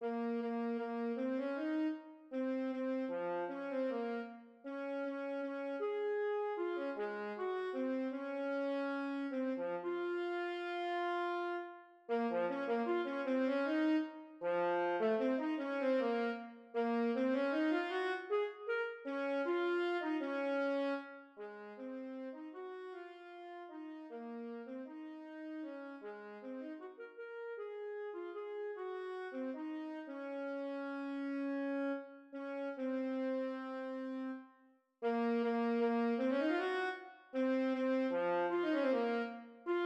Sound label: Alto Sax; Band Audition